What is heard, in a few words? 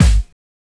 KOMMERZDRUMS